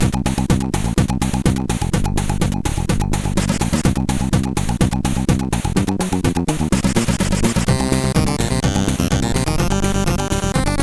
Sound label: Funk, sid MOS6581R4; Pocket; hvsc